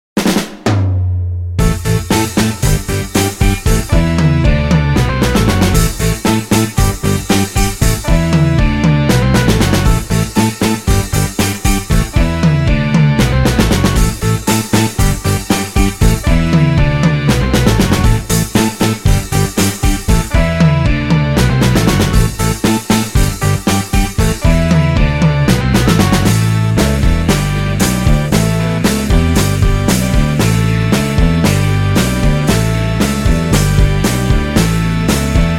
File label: (A To Bb) No Backing Vocals